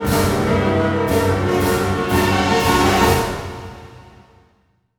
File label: Orchestral Loops; 128 MANHATTAN